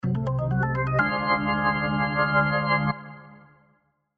Organ Run Chord, Organ Run Chord Dissonant Soap Opera; Keyboard; Musical; Blastwave